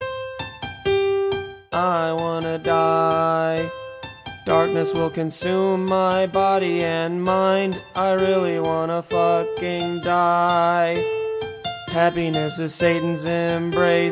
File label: Sigh